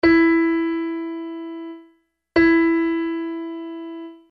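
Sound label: E Equal and E Just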